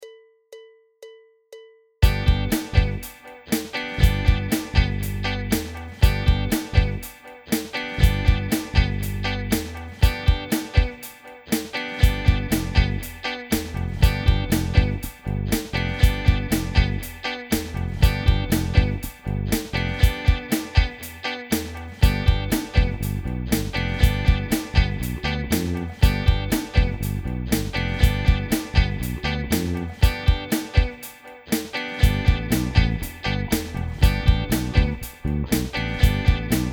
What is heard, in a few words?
impro g7